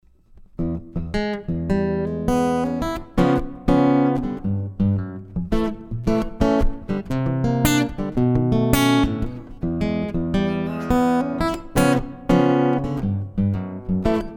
Fishman Aura Spectrum Jumbo Picking Ibanez AW54 Comp